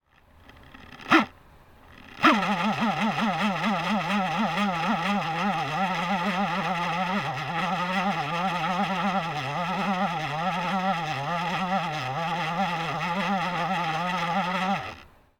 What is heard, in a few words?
honking laptop